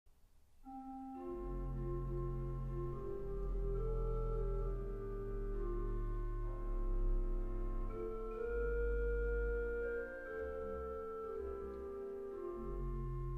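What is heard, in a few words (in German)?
soprano; trauermusik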